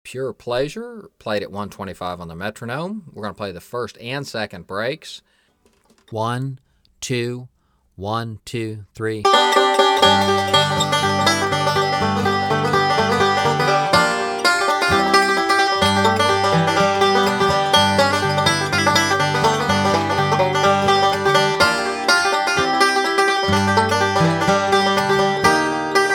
Pure Pleasure Breaks 1 2, 125 Bpm

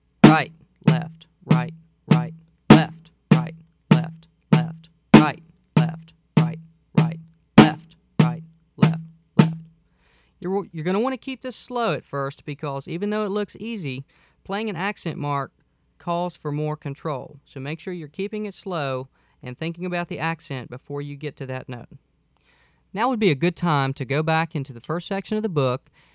Rudiment 2

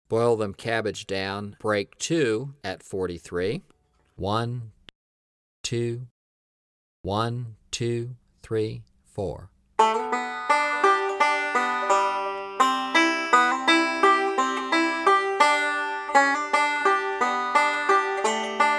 43 Bpm; Boil Them Cabbage Down Break 2

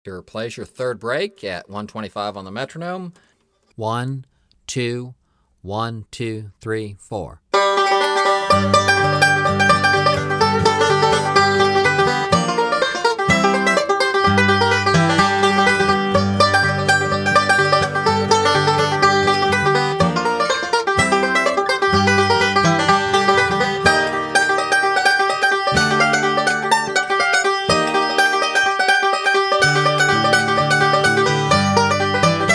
125 Bpm

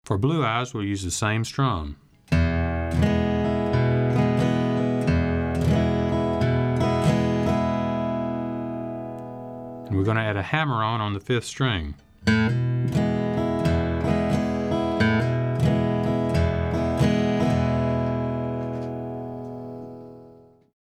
Strum

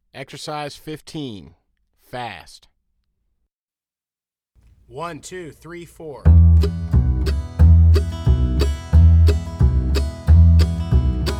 Exercise Fast

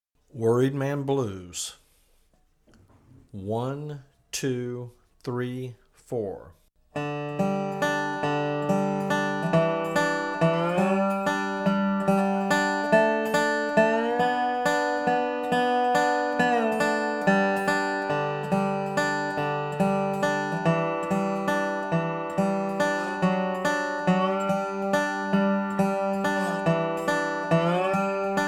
Worried Man Blues Slow

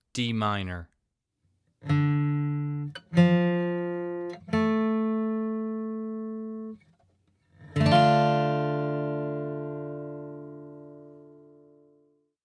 D Minor Chord

